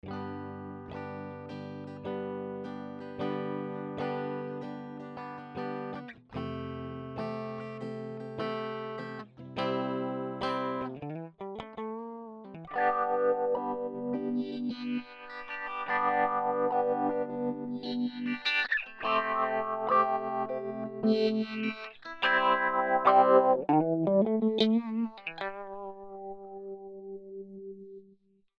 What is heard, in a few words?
swirling cascade